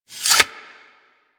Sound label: Dagger Sheath; Warframe; Weapons; Dual Daggers